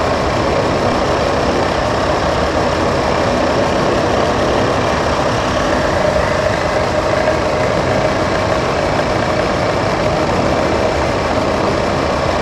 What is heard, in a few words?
Prop Rack Small Move Dist, Warehouse; Titanfall 2; Boomtown